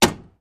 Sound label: Switches, Industrial